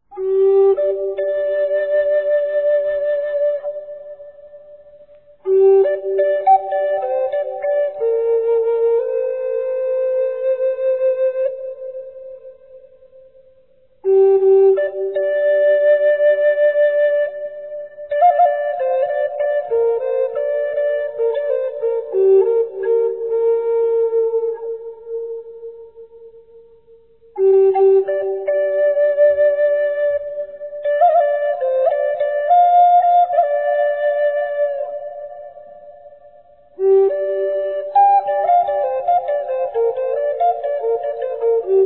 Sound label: NA FLUTE